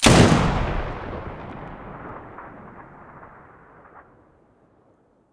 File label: muzzle spas12 mono, weapons